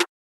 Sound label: Snare, trap drum kit